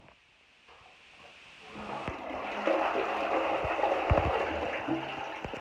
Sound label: toilet splush